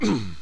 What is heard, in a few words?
fall; sarge